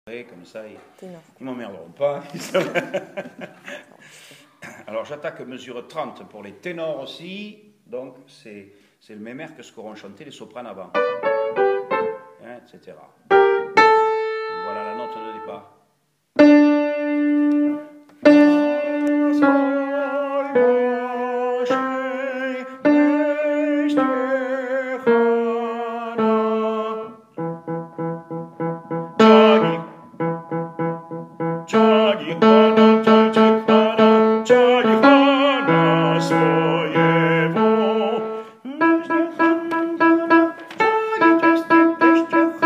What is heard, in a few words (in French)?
tenor